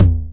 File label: TR-909 Tom Mid, Roland TR-909